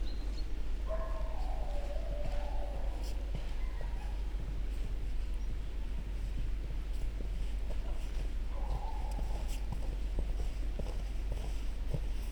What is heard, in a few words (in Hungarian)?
természetjárás kutyaugatás